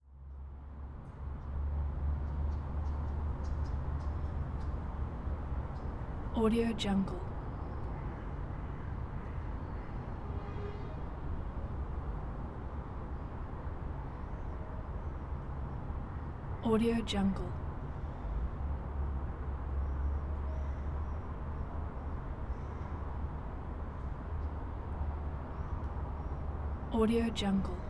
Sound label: quiet industrial or distant city ambience